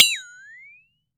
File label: FLEXATONE